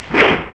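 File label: PERCUSSION SWISCH, Techno Perc; Roland L-CD701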